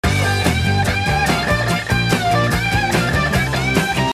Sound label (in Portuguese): rock muito louco